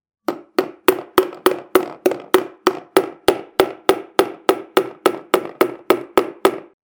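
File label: plastic sound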